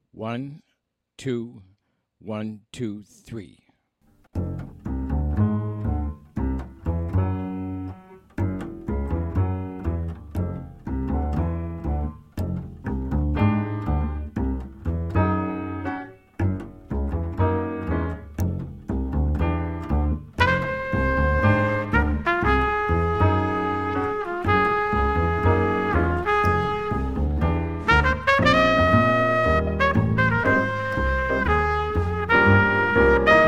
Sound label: minus Drums